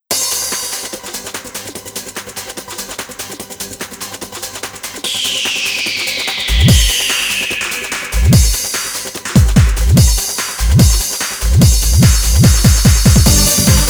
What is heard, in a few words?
Jingle Bells -Trance Mix-; Groove Coaster Original Soundtrack